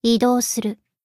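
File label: attack; hina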